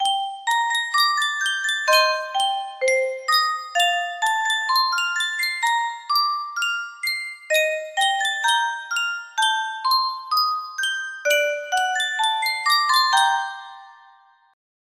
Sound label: custom tune music box